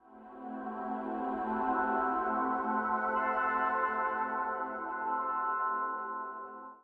SPACE PAD 140 A; SpaceSounds

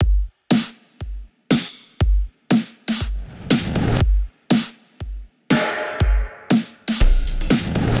120 electrofunk, Electric Drums; Loops; Touch Loops; CosmicFunk

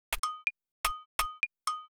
HOUSE PERCUSSION 125; Beats

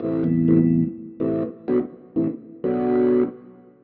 DEEPHOUSE ORGAN 125 A

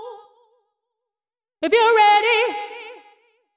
deep house female, 125, A, vocals

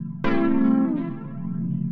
house synth 125 A, keys

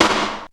SD HOHL, snare drum; SNAREDRUMS

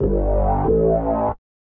SPACE SYNTH 140 A; SpaceSounds

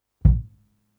Kicks; Touch Loops; CosmicFunk

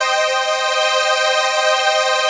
PAD

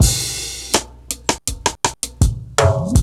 RIM LOOP A 98; 0- 99 BPM

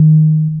Bass, One Shots; Touch Loops; BlissedOutBeats

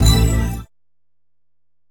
HOUSE FX 125

